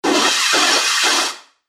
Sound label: Explosive White Noise; Noise Music